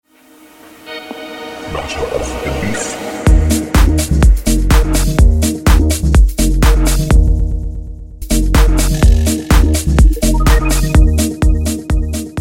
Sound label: Release FX Echo